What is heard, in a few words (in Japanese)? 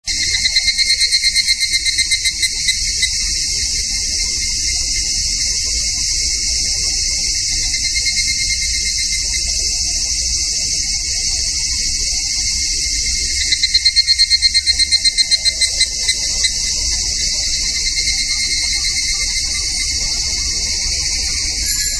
higurashi